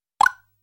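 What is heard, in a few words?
New Message Beep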